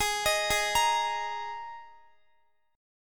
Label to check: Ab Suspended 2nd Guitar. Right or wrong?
Right